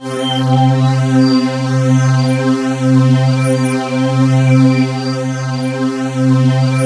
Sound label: C4, Synth Line; 90 s Sample CDs; NiceBeats; SynthLine VOL-2